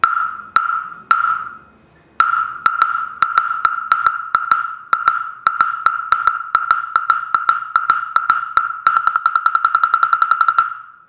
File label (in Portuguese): caixa china